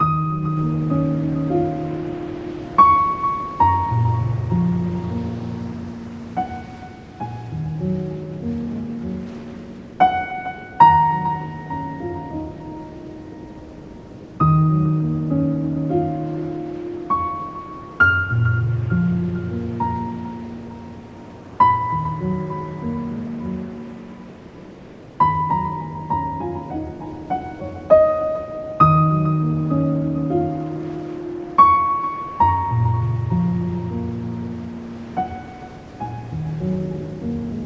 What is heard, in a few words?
Title Screen Piano Only; oceans heart